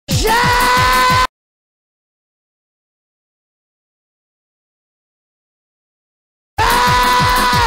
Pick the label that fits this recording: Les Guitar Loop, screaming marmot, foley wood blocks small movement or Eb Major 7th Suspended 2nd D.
screaming marmot